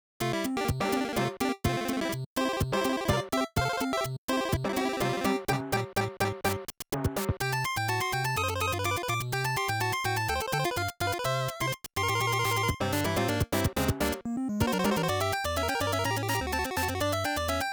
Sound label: BGM BTL Normal; PAPER MARIO Color Splash; wiiu